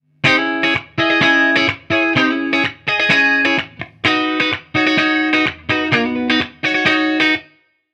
Funk, ringtones; Marshall; London KB 1501

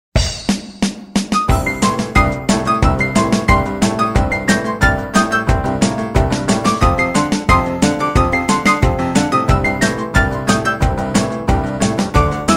RocknRollParty, ringtones; Yamaha SMAF demo ringtones

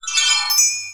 unlock sound; ZTE Nubia Z5S Mini; ui

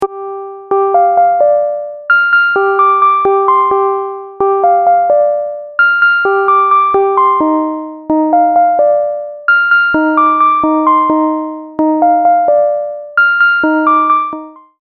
NET PHONE RINGTONE; Smart

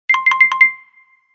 Knock, ringtones; Qiku; Luna Youth

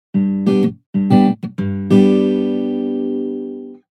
Guitar; Gome; U7

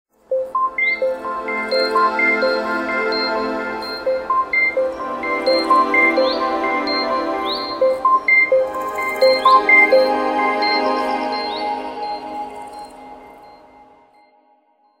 Clock Explore, Nokia Tones; Windows Phone apps

